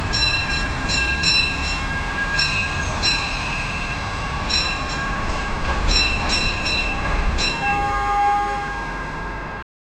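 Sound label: camera clicks train whistle; transportation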